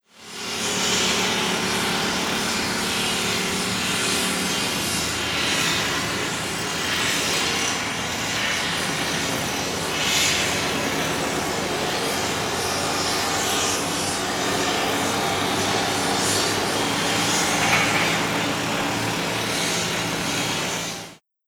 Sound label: Construction